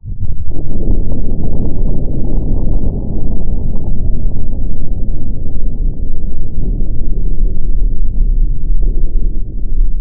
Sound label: Mech Explosion